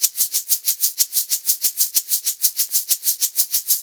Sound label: Blues Perc Loop; Drum Tools